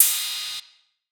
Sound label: cymbal splash choke; Drum Sample Pack; One Shots; Slate; SEISMIC